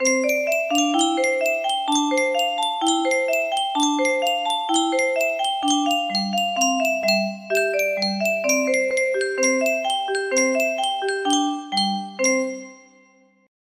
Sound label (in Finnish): Yunsheng soittorasia